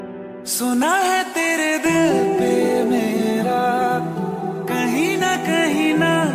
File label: Love Song Ringtone